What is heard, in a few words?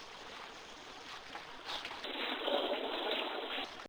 basement